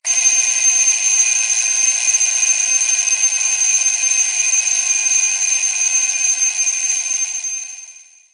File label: Mechanical, phonetones alarms